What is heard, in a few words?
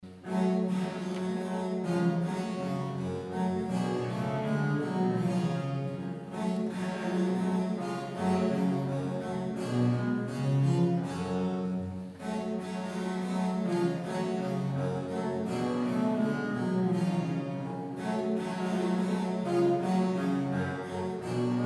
rigaudon; boismortier op31